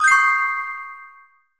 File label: achievement